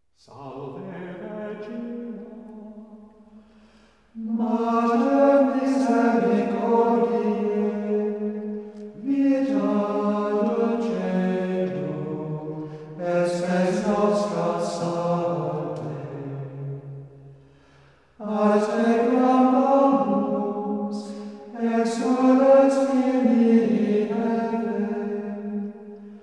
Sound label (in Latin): antiphona salve regina, gregorian chant